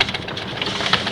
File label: MACHINES